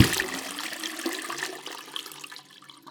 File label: Flush, household rhythm samples Hits